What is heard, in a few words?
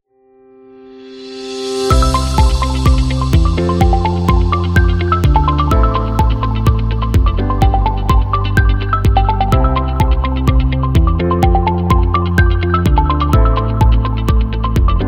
Birthday Corporate